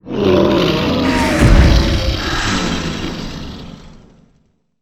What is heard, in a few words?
arioch death, monster